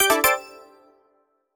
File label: Jingle Final Lap; Clubhouse Games: 51 Worldwide Classics